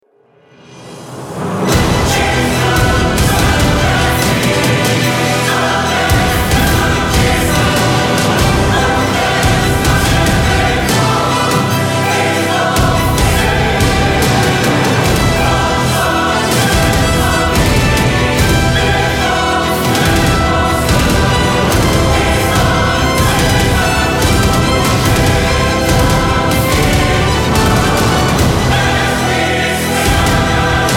BPM72 Em Overture; Boss Battle; Nameless Knight

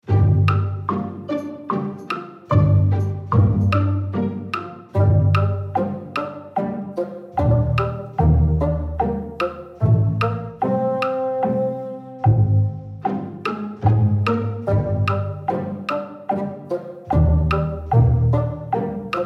Village BPM74 Gm, Free Music

